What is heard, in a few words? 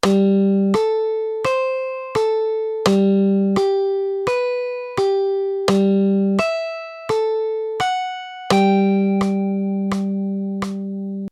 Guitar Arpeggio clean